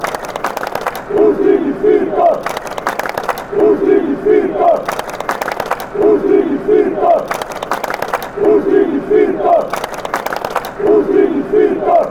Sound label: montenegro chant